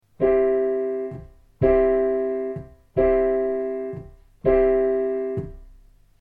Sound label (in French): accord